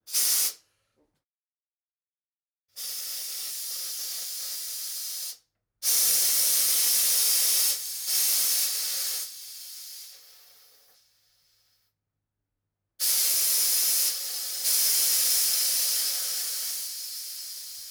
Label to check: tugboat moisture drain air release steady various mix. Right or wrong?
Right